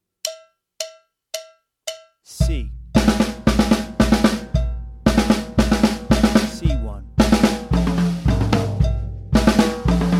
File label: Drum Fills